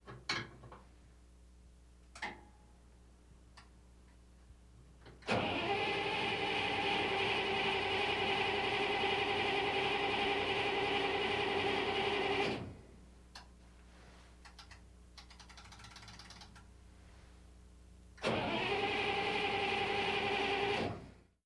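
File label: APC False Start Exterior, armored personnel carrier; Mono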